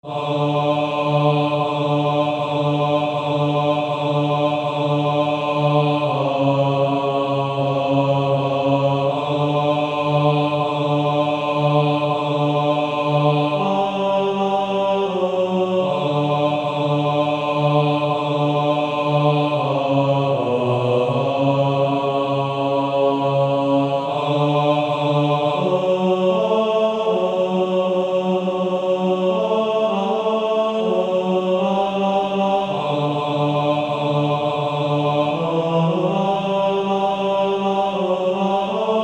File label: Male Choir; Low In The Grave He Lay